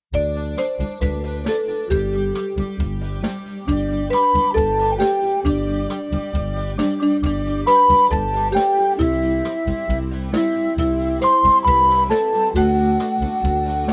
Jingle Bells

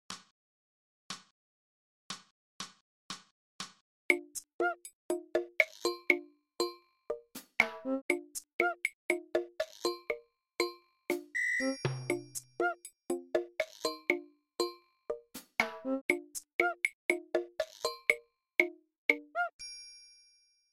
Claves

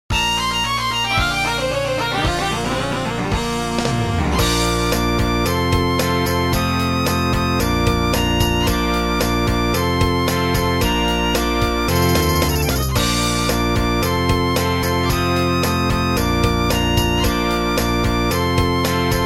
Trompet Rap

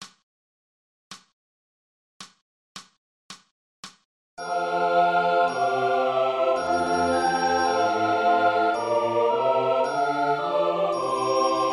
Triangel

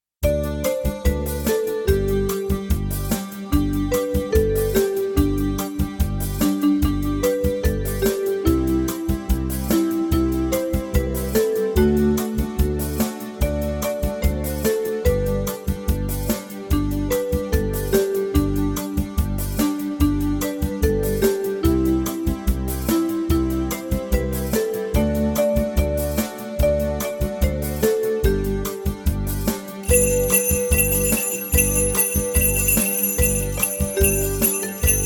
Jingle Bells

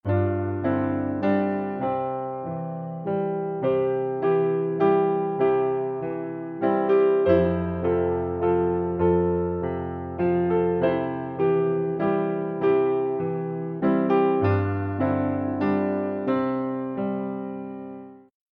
Knikkeren 1e stem

